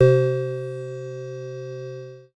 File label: SYNTH